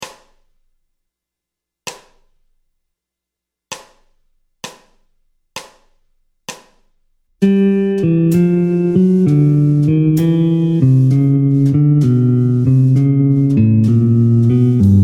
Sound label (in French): Gamme bop desc Pos 62 G Lydien, guitare jazz; boper ses gammes position 62